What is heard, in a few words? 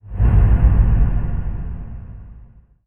woosh